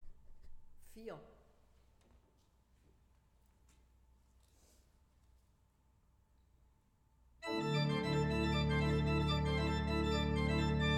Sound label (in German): Weigle, gebrauchtorgel